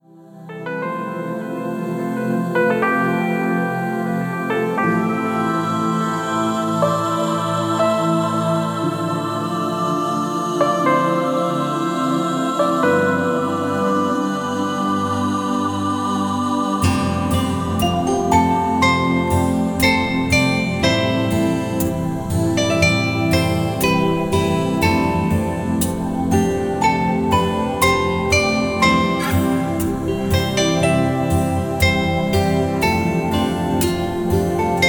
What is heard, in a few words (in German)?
Melodien der Natur, Das kleine Blatt im Wind; wellness; Largo